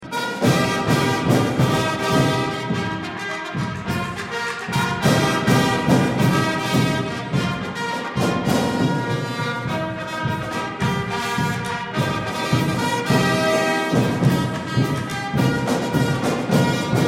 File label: Trumpet Rock